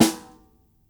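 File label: snare drum, acoustic drum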